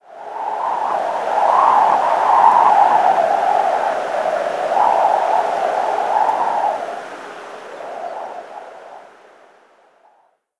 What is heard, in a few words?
thin wind gust, ambient; Left 4 Dead 2; random amb sounds